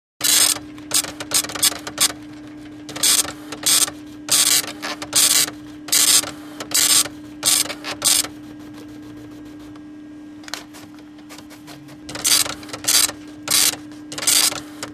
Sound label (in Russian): matrichnyj printer